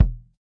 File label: kick, drums